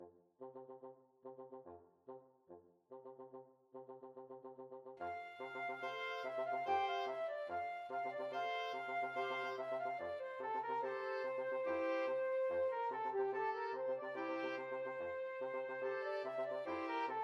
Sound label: flexible mixed ensemble, 4 players